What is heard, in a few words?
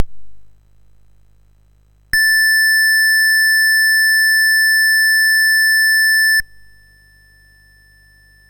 Dragonworld sid MOS6581R4